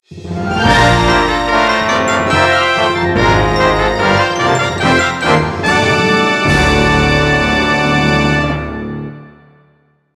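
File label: Jingle Fanfare; A-Train City Simulator; A Ressha de Ikou 3D; 3DS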